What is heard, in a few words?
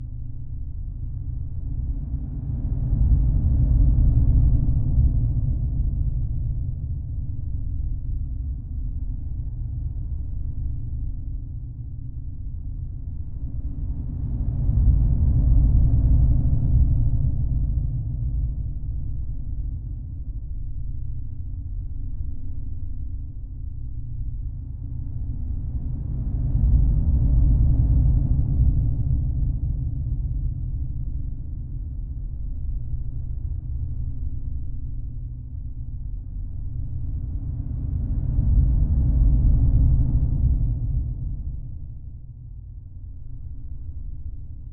Ambiance Dark